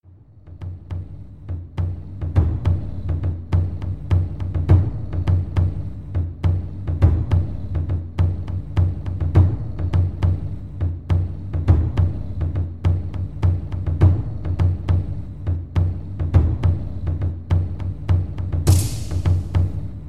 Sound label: Jungle Drum